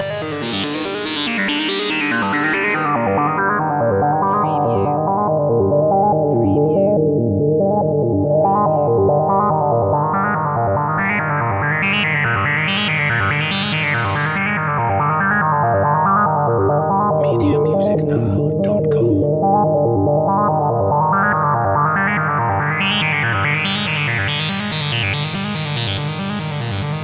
Techno-Trance Synth Arpeggio Loop JX8P in G 142 BPM